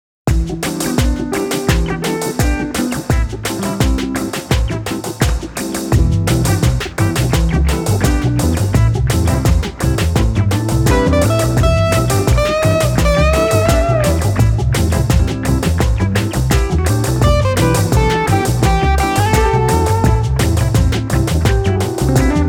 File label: Afro Funk